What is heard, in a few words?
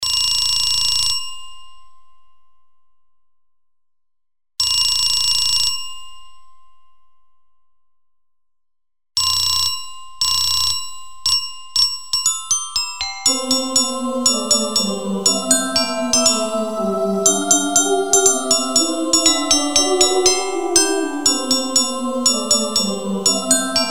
jingle bells